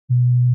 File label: Censor Bleep 1kHz